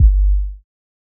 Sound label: bass bassic; neuro; Sinistarr; Synth & Bass